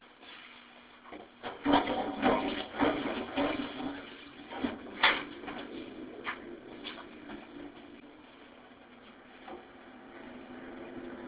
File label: toilet